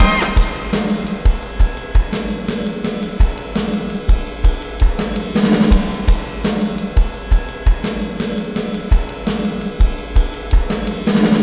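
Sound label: drum, 084bpm, Breakbeat; ProSamples vol.40 - Breakbeat 2; Mr Davis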